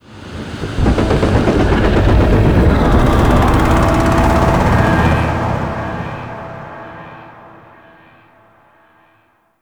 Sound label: Void Dragon Vocal Distant Idle; Warframe; Duviri